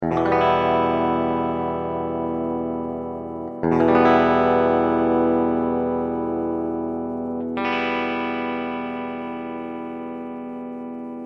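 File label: clean electric guitar, DOD 690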